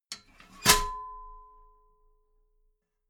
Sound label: metal ladder small handle fold heavy